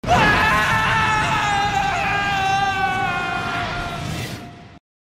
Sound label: Anime screams; فریاد های انیمه ای